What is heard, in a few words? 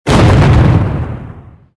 explosion